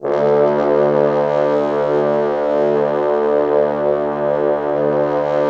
Orchestral Brass

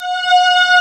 STRING A, M1; East West - Global Sounds

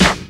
SD HOU, Dance Mega Drums; Best Service

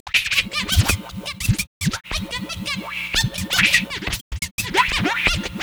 DJ Work; Sonic Foundry (Sony Creative Software); Stylus Pressure Urban Grooves on Digital Wax; Wickey Wah

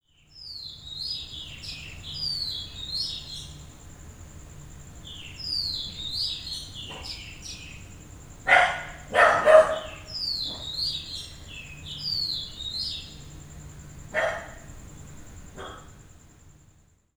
WHISTLING, Indian Traditions; USB Soundscan vol.45 - Indian Traditions; AKAI; AMBIENCE2

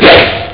sword; ST-XX Amiga Soundtracker Sample Packs

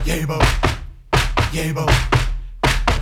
80 YEBO, Group Chants; Spectrasonics; Supreme Beats; African Contemporary

